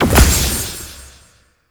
Corruption Pickup Large; Warframe; Zariman; Missions

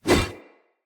One Handed Axe Elite Whoosh

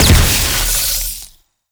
Wave Ability Ice Proj By Explosion; Enemies; Abilities

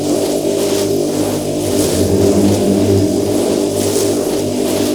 Thurible Loop; Warframe; Priest

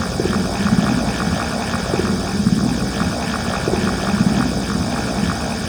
Console Computer Amb Loop; Warframe

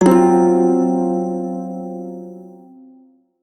Prime Shawzin Hirajoshi Chord, Music; Warframe

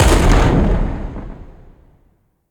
Siege Distant Explosion; Level Events; Midrath; Warsong Prologue

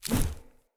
Melee Whoosh Fill; Enemy; Characters; Corrupted Sproutfolk

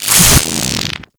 Arc Rifle Fire Stop; Infested

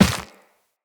Operator Run Dirt, Footsteps; Characters

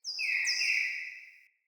Cardinal Female Bird Song; Vocals; Player; Animals

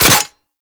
Wrist Gun Mech; Weapons; Warframe; Tenno

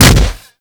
Impact Flesh, Weapons; Warframe; Furax; Impacts

